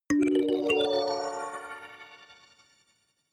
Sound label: notification kalimba magical fifth up